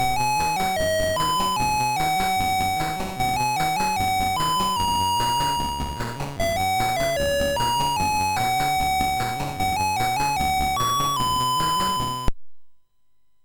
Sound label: MOS6581R3, GAMES; Snowman Mr Freeze